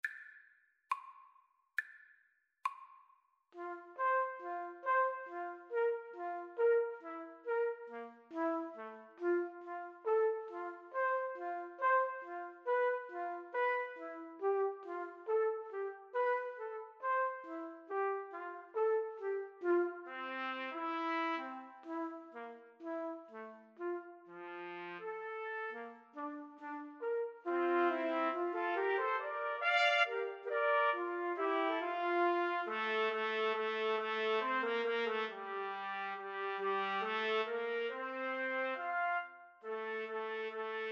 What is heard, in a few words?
3TPT, Trumpet Trio